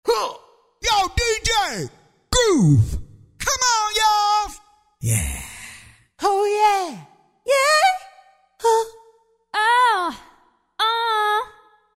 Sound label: Vox Menu; Roland SRX-05 Supreme Dance